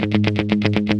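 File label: GUI 120, guitar; Best Service Gigapack 2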